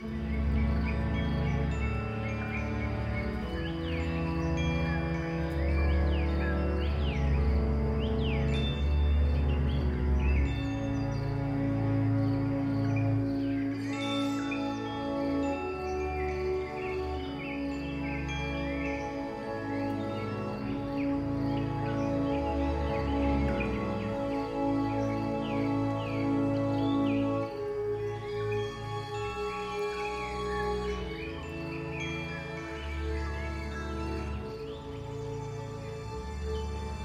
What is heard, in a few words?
music bed; Peter And The Lost Boys; peter Pan; Push Gaming